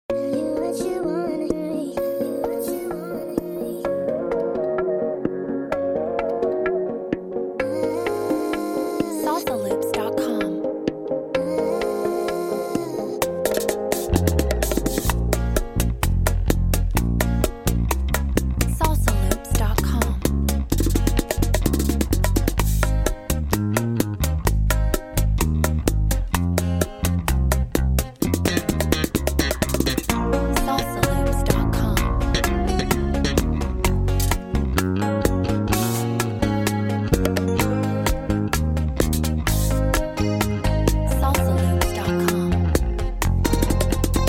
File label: Bachata Loops Voiceover